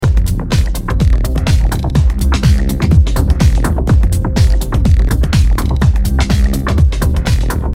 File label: Loop